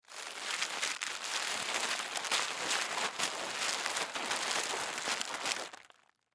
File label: Bubble Wrap Wrap, Bubble Wrap Wrap Object Plastic; Foley; Materials; Blastwave